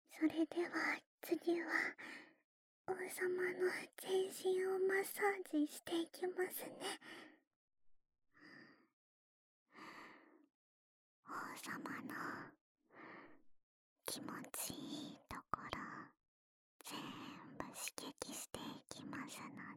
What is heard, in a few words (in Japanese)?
性感 ローション マッサージ